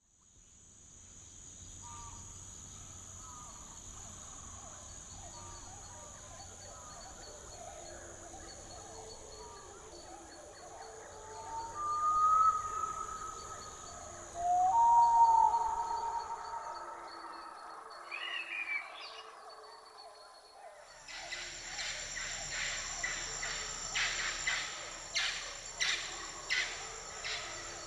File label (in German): Soundkulisse Dschungel